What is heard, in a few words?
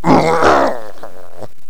mummy die; khanat; megaglest